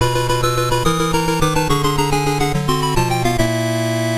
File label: fail music